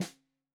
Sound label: Snare 65 Reg Stereo, Deeply Sampled Drums